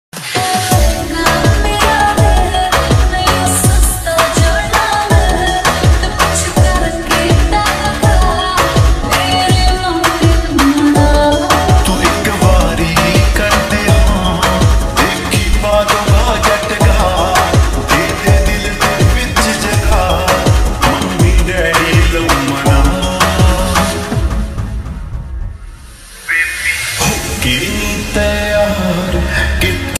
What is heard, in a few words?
slowed reverb ringtone